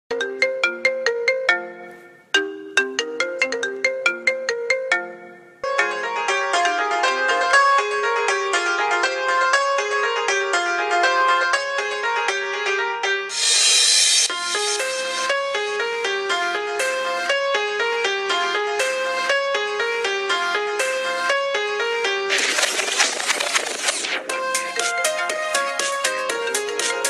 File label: marimba remix ringtone